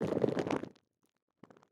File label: Rockfall, Rock; Hits; Materials; openclonk